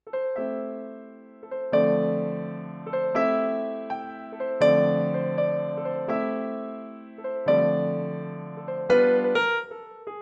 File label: cool jazz, piano